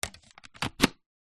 household air conditioner plastic remote slide into wall mounted holder; zapsplat